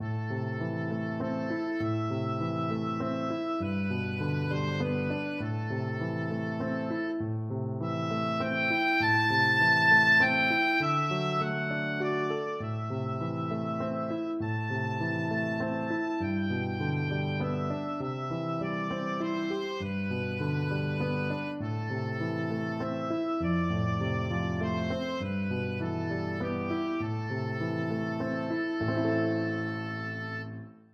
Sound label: scarborough fair, oboe